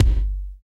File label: BD, BD Dance; Sound & Vision; Gigapack I CD 1